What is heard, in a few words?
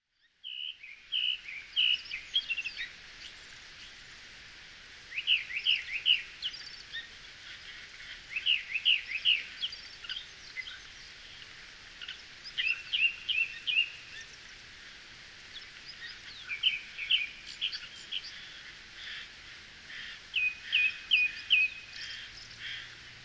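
kurotsugumi, Japanese Thrush; okutadami; koe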